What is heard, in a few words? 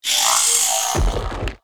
die; Mob